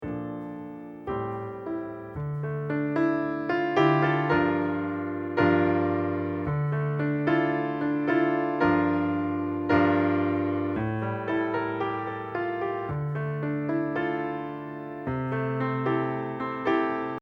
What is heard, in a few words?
accomp